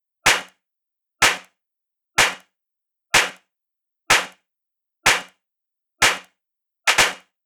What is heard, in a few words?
Zippel Beat, dance electro; drum сэмплы